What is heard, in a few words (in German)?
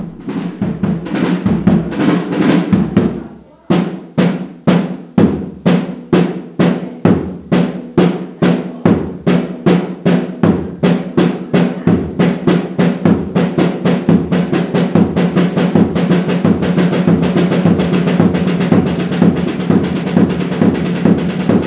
trommelsolo; 40er